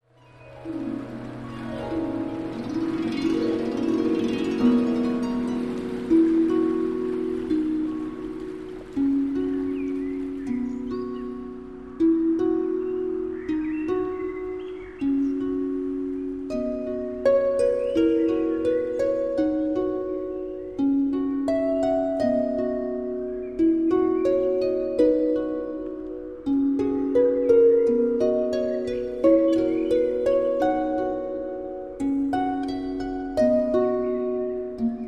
Magical Harp

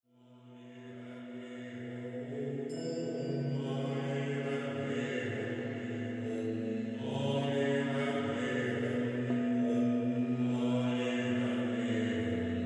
Tibetan Healing Sounds

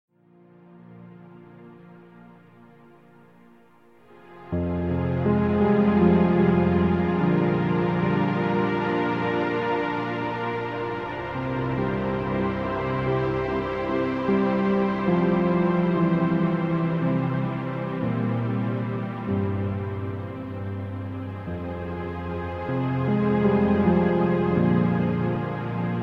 Piano Mix

